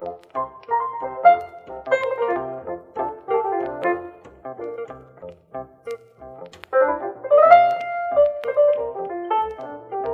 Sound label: piano, Phonky loops